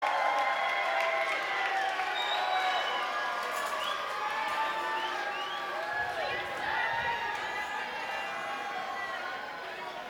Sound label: Applause